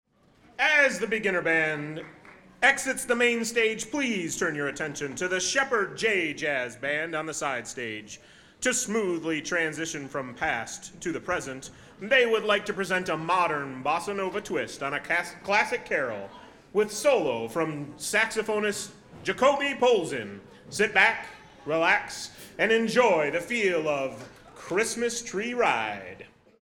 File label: Jazz Intro